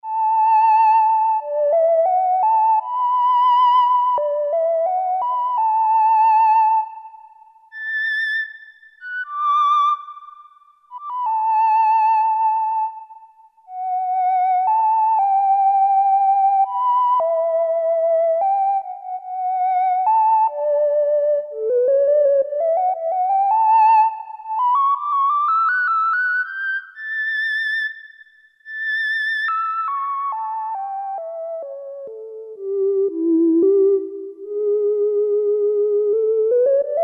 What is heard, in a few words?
ONDES MARTIN